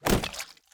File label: spears hit